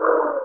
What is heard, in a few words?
move; MEDJED